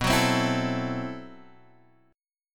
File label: A-Minor 6th B, chord; Am6/B strummed; JGuitar